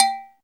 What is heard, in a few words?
Agogo Hi, Percussion Kit